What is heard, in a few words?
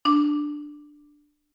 tone